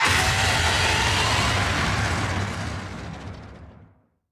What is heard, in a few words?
anger voice